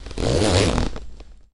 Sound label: Denim Jean Zipper; zippers